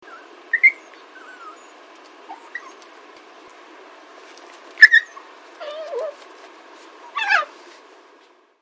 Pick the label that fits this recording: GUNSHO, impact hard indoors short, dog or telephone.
dog